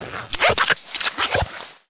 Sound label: zippers and clicks